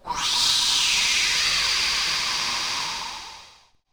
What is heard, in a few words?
wind; Cosmic Rage; planetoid